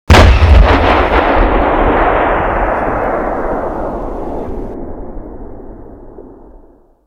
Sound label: Vehicle Weapons